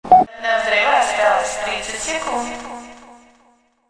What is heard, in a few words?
30sec, bombtimer